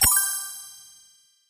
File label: card to foundation